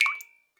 Drips

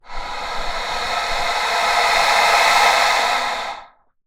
Wind, Melodica

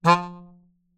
Melodica Short Note

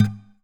Classical Guitar Extended Technique Plink

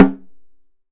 Snare Drum; Percussion Samples

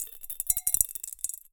Drop In Glass, Medium Wine Glass

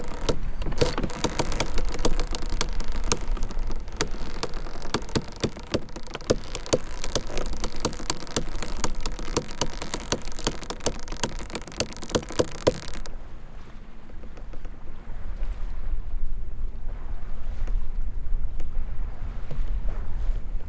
Sailing

